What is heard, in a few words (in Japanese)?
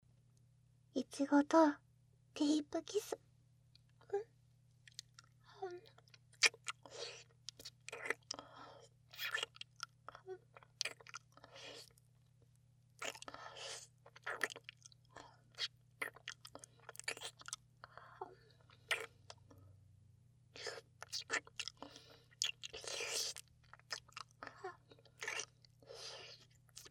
ディープキス